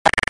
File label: hover